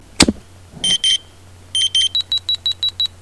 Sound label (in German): Tubus verklemmt